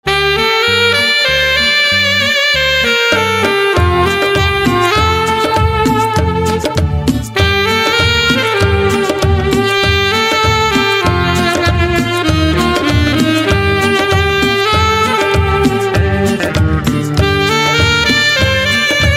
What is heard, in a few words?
Saxophone, instrumental ringtone